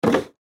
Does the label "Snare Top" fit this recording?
No